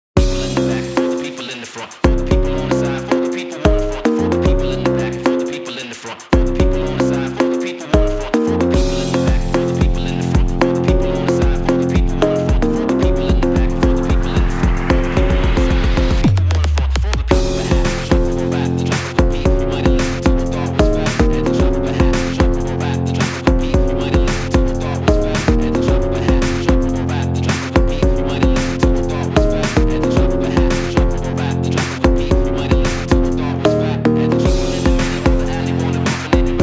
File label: Eerie Echoes